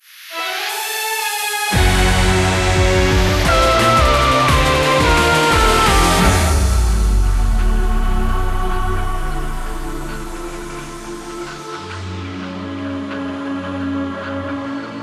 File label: Victory Jingle 174 4-4; Skiads